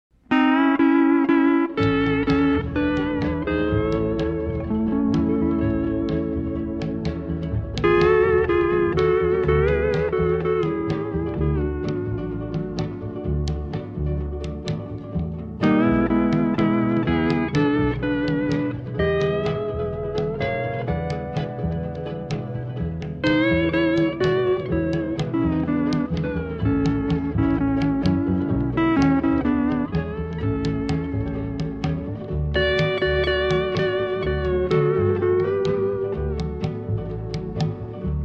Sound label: Instrumental -